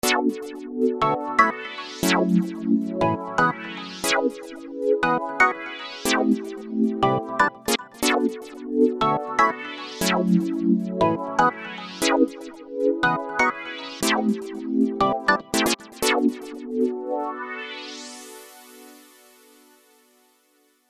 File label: Chord Split; KORKLCDE